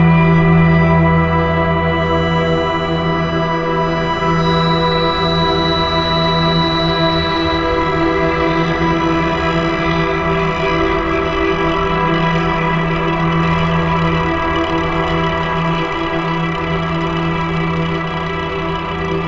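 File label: pad loop acretion disk 120 E minor; Virtual Riot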